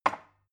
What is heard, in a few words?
glass put down cupboard